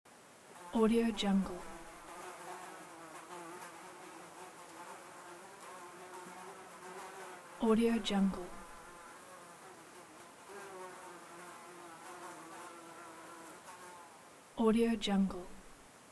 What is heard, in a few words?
fly flies in the room